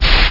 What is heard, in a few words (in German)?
RAUSCH; mruwurm